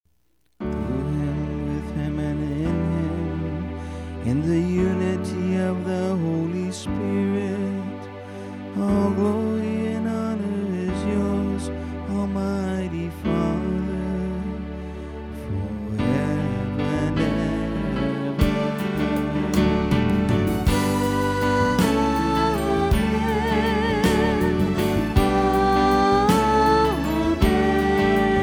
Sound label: Amen; Viera Mass